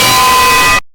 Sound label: anvil loud